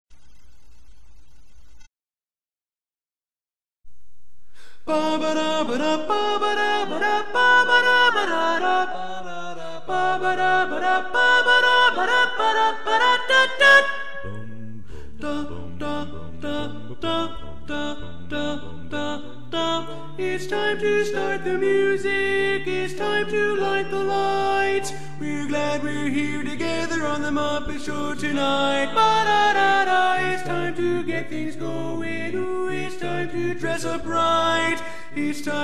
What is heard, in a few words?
Tenor Predominant; Muppet Show